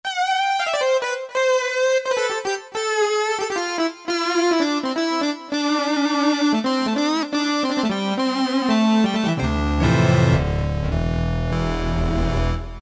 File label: VCO, Yamaha TG33; Factory Presets; Preset bank 1